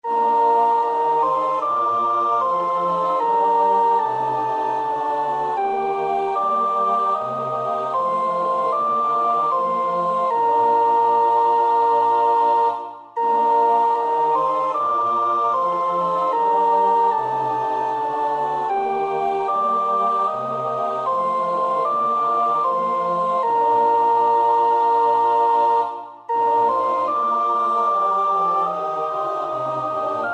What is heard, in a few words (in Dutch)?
sopraan, koorpartij; Bach (Johann Sebastiaan); BWV 164; Ertöt uns durch dein Güte